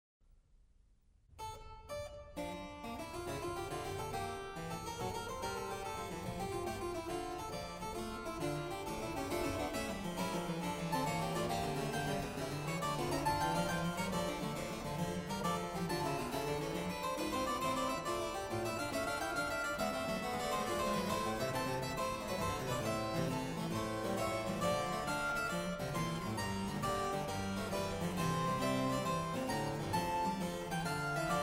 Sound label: fuga